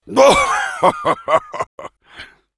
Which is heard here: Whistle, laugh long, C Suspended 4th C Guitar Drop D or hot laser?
laugh long